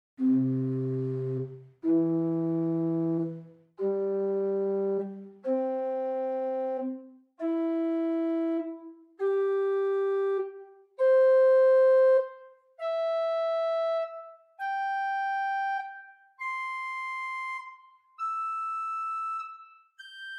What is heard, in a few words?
Oktave 4 arp, organ stops; Grace Lutheran, Champaign